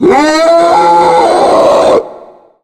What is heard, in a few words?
charger charge, zombie; Left 4 Fortress